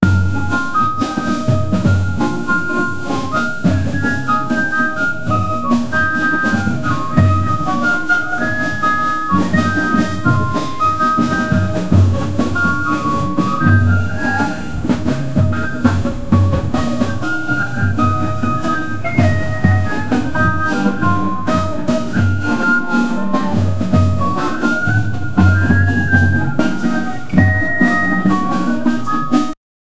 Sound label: atmosphere